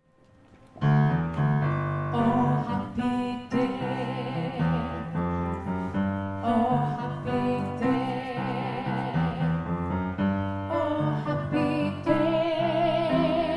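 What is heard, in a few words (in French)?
ad lib et fin alto homme, gospel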